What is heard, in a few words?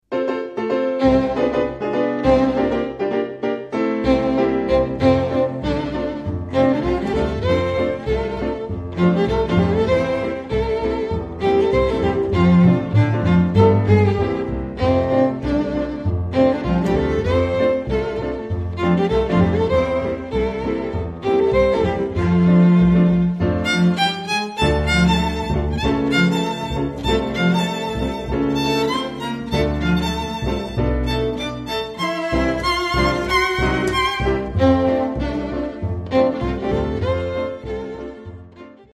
swing, salonmusik